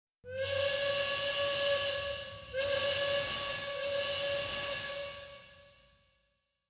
SoundFX - Train Whistle

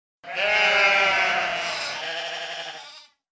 Sheep Baa

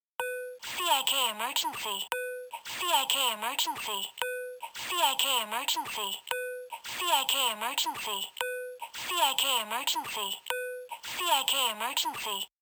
Emergency SFX; Christmas 2024 - We Three Spies

